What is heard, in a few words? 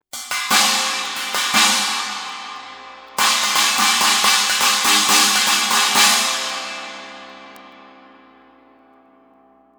chinese 16, cymbal; sabian